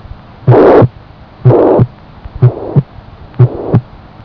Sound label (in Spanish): regurgitacion tricuspidea